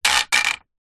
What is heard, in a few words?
household broom plastic metal fall over bouce hard ground; zapsplat